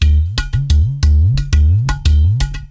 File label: Udu (90BPM); algorave